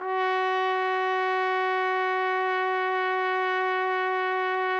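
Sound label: trumpet; Roland - LA Composer vol. 3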